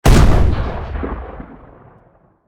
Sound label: Armored tank cannon fire single shot